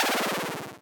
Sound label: death